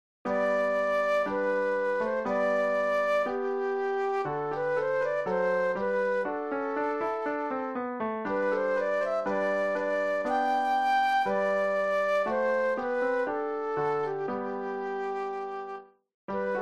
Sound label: Flute Solo; classical